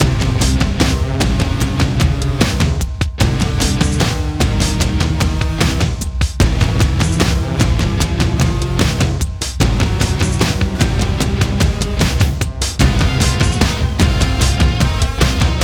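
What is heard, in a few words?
Music Industrial, Industrial Pre Race 75bpm; Forza Motorsport 6 Apex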